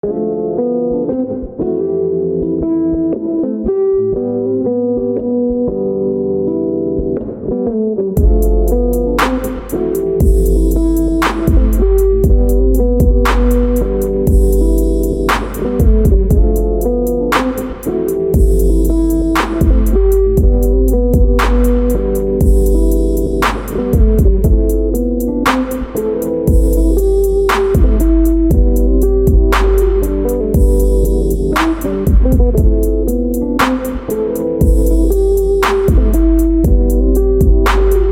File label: beat love song